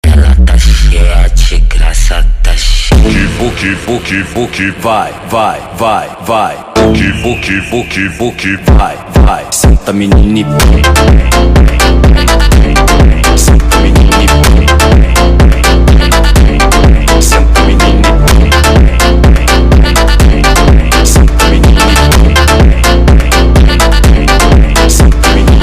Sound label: Funk